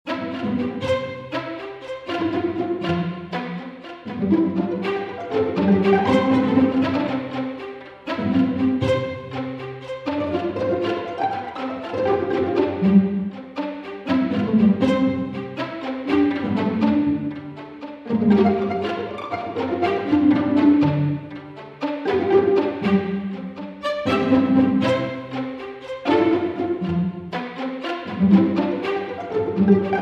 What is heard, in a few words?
pizzicato strings